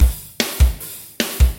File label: metal